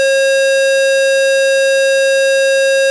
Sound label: Aircrafts A-10 Cockpit Stall Warn Steady; DCS